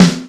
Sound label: rich low end snare drum sound f sharp key